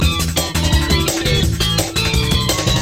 surf loop

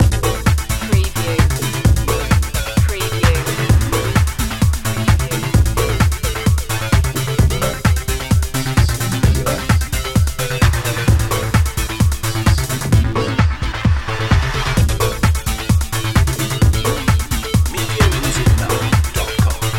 thrillseeker loop

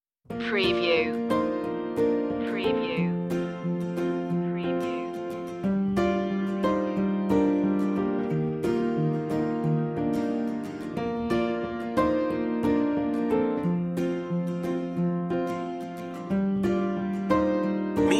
acoustic mix

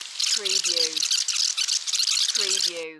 Micro Tape Rewind

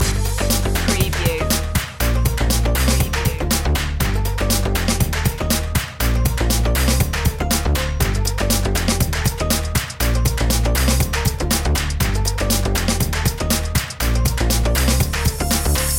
latin groove loop; media music now

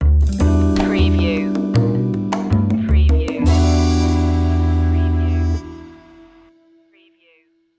jazzy opener

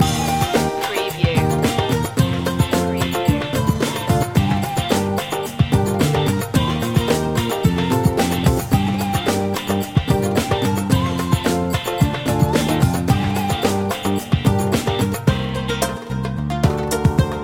persian night loop

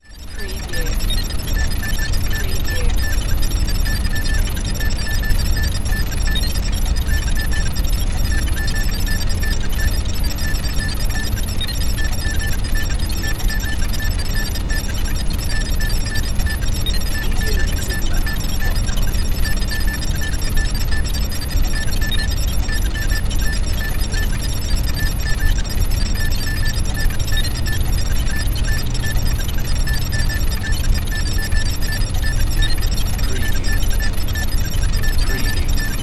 Computer Room Beeps

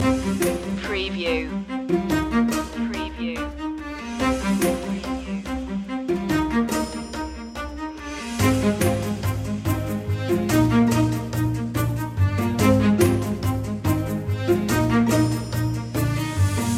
curious machine loop

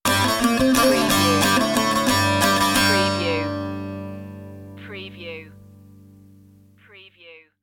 eastern ceremony stinger

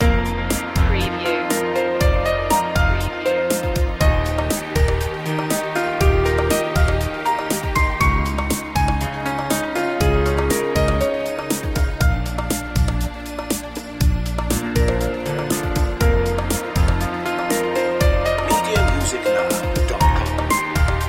string beats loop

